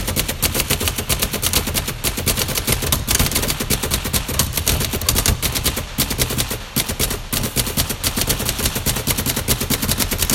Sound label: Types; tim youd